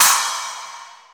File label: crash single shot c sharp key